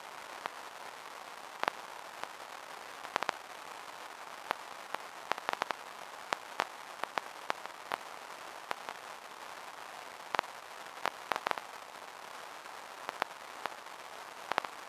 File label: Noisy Vinyl Crackle